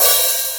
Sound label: sizzly open high hat b key, open hi hat one shot tuned to the B note